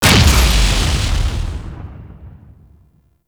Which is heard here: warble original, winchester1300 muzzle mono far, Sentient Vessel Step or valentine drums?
Sentient Vessel Step